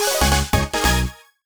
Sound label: Jingle Finish